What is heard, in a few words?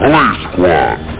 police squard